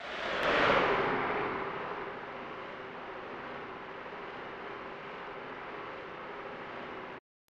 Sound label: Booing; Zico Soccer; snes